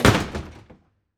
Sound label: DOOR